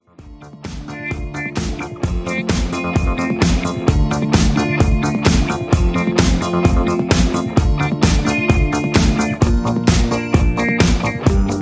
Hoedown

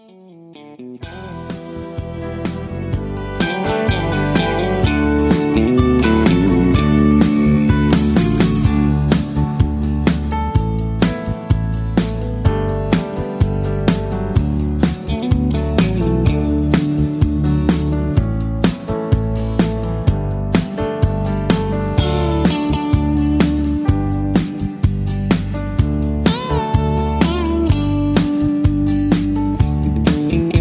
Country Instrumental No Melody

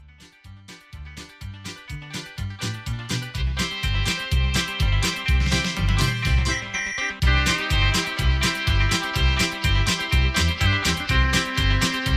Patter

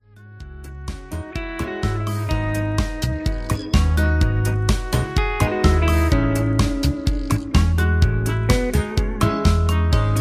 instrumental low lead trumpet

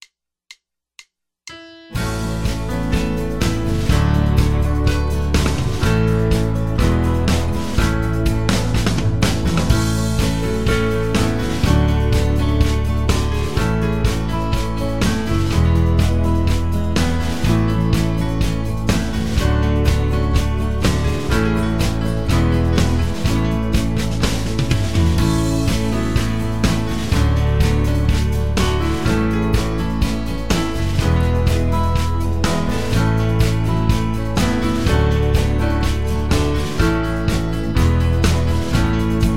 Instrumental with Melody

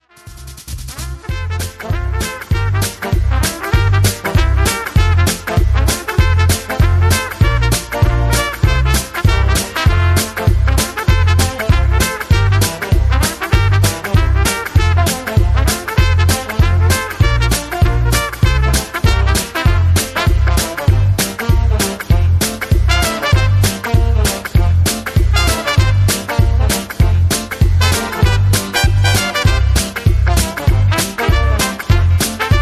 Jazzy Trumpeter Hoedown; patter

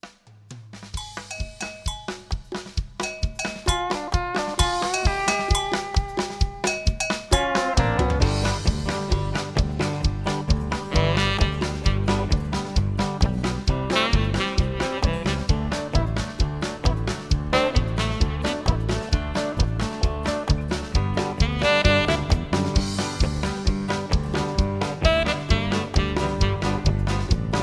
Patter

